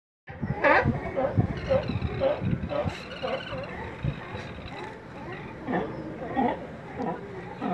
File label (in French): Lions de mer; Monterey; californie; oregon